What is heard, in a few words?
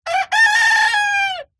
Rooster